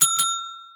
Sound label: pickup; earth-to-echo